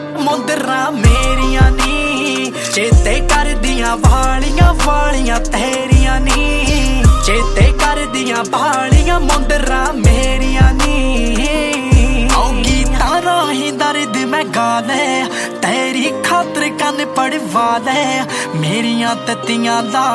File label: Punjabi Song Ringtone